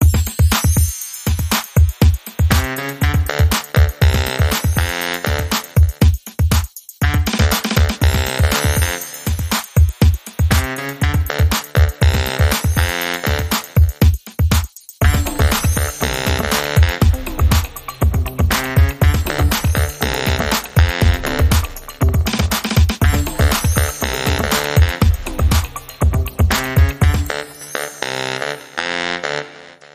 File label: The Baritone